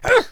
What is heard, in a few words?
Attack Vocals